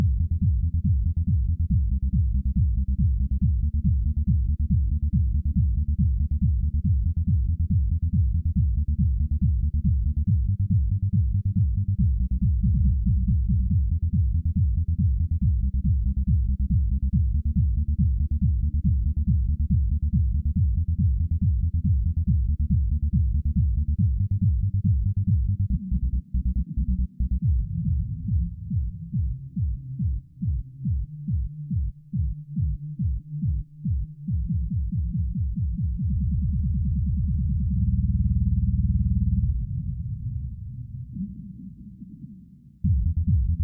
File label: Celebrate bass; Audacity